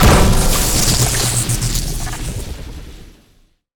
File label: Chain Lightning Fire Burst; Evolve; Elder Kraken